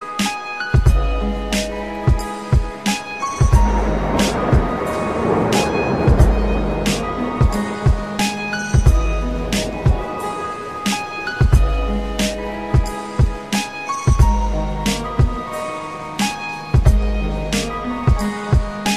Rain

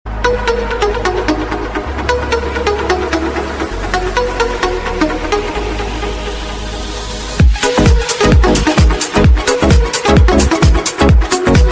Techno Music

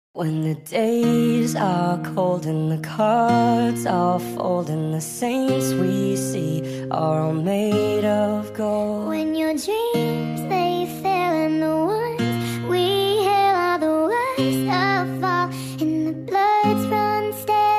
Nightcore

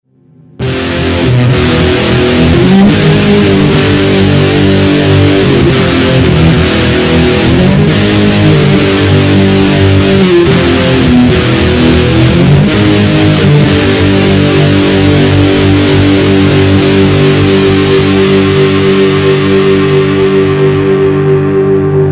Rock Guitar

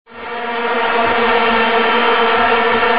Vuvuzela Sms

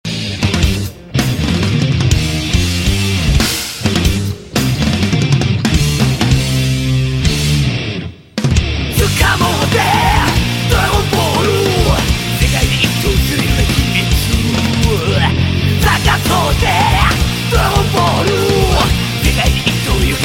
Metal; Dragon Ball Z

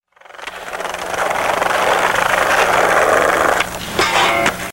Skateboard Grind

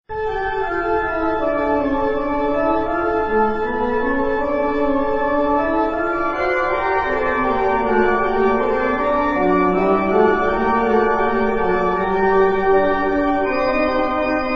Fugue In D

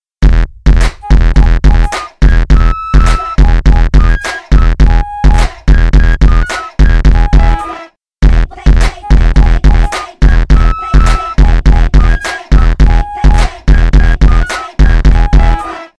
Flute Trance

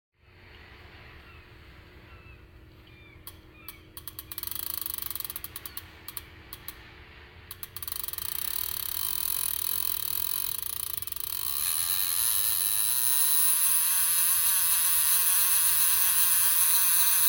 Fishing reel

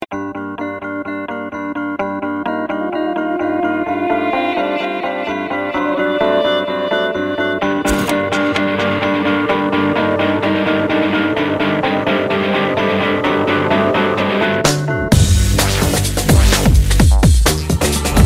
Bgm; Heroine

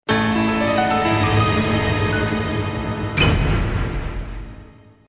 Game Over

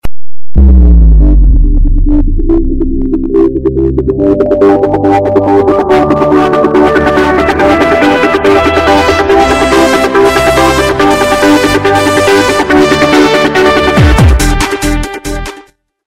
Eurodance trance

